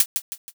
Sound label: hihat